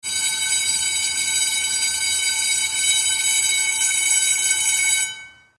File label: traditional school bell